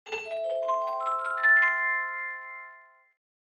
ambience; epiVerb

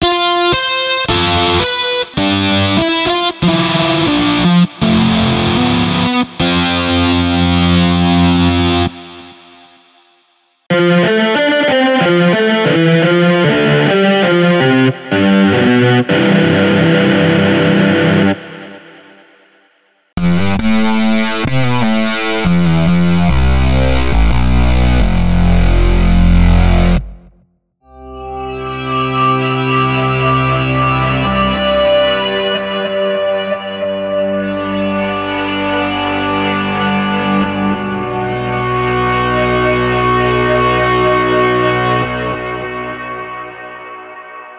Distorted

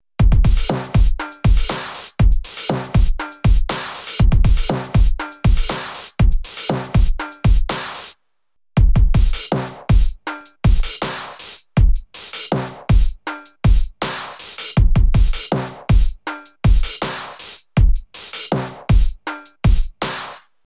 Tech Funk to Hip Hop; UJAM; Beatmaker; EDEN

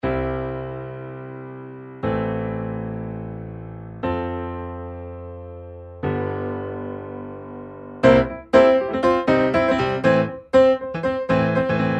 EZKeys Motown; Toontrack

